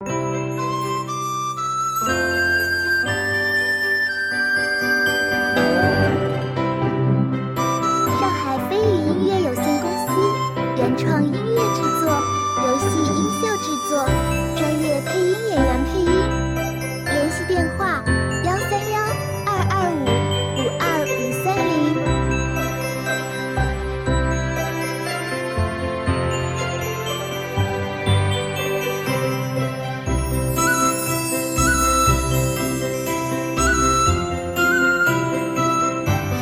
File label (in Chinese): 轻松-欢快-希望-中国风